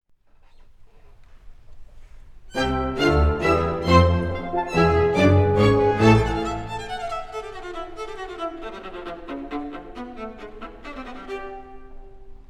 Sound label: Viola Concerto